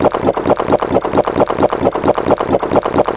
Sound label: chug super